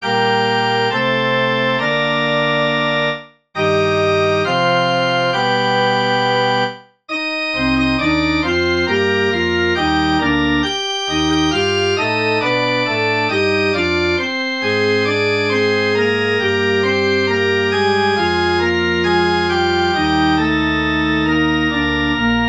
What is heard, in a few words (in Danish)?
forspil; se nu stiger solen